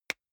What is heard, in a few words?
marker open lid